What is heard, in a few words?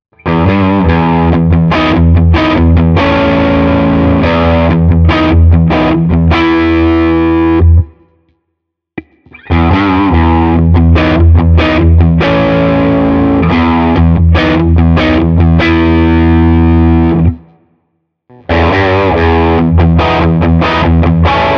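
Hagström mid gain Riff A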